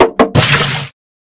ship entry

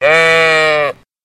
sheep

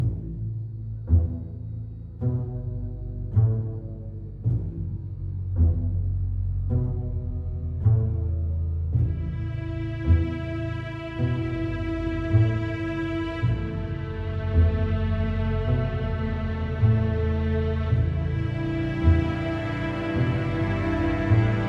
cave steady, music

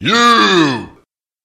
monster pirate captain

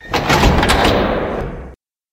door open

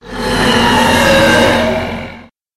monster hydra

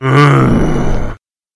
monster undead mage

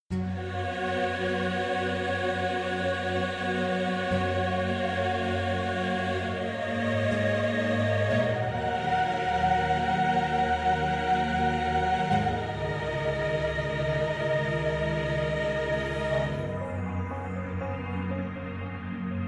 misc church, music

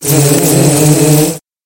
monster spore swarm

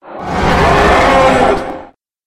monster dragon

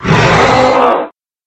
monster werewolf

